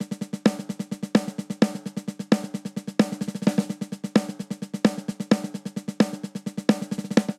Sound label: Driving Rock Snare Only 130 bpm; 4 4; MIDI Clips; Ableton; Factory Packs; Drum Booth